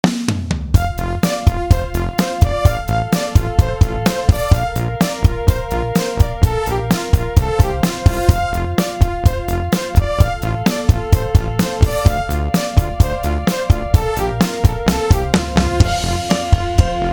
Synthology

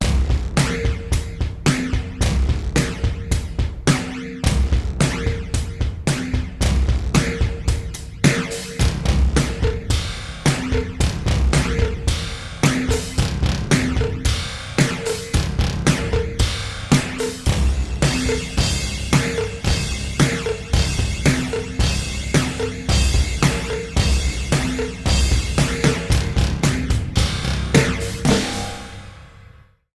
ElectroRock

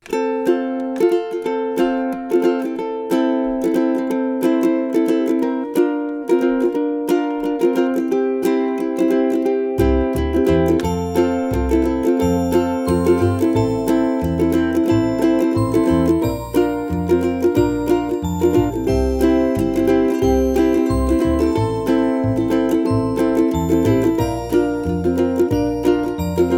ukulele songs; ukulele songs 2

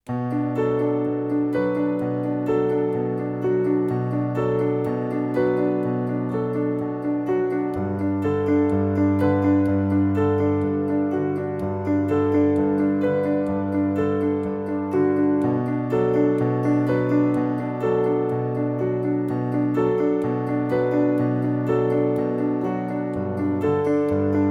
Circle Dance, no cello

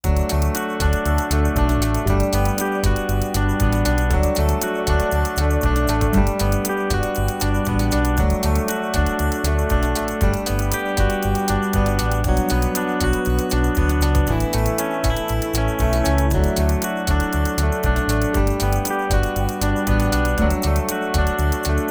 no ac gtr

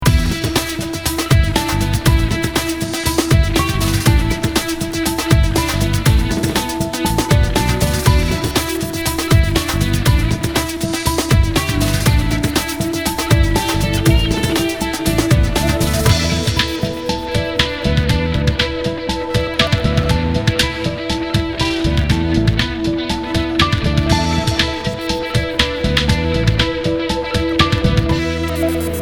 Data Retrieval Alt Gtr

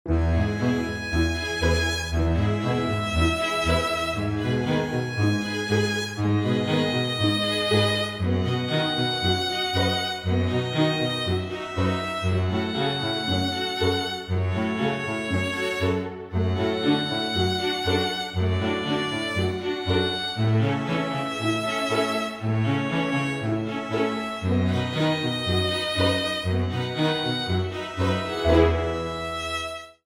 Recital Piece (no drums), string theory

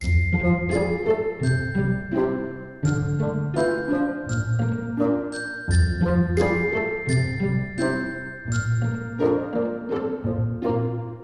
Whimsical Nature

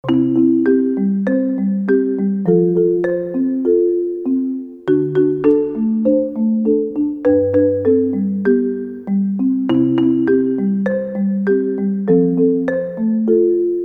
Mallet Music

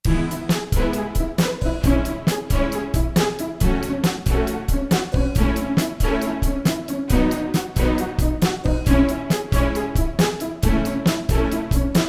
Happy Strings

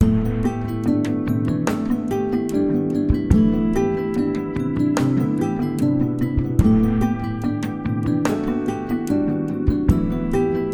no ac gtr

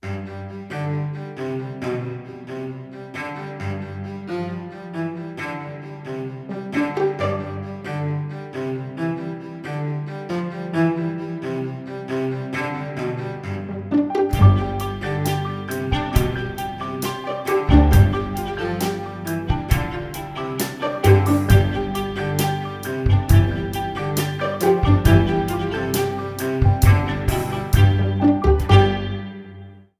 Constant Cello

